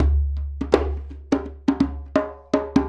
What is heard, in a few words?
Djembe Fill; Sonic Foundry (Sony Creative Software); Essential Percussion; Drums